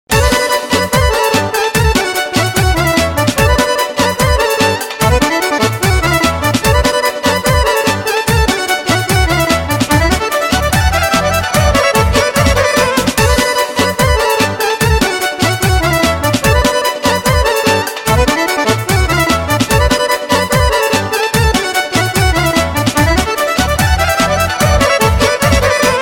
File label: Romanian Violin